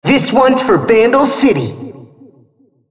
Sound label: attack; RumbleSkin03